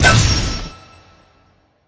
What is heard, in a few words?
Level Win Star; fowl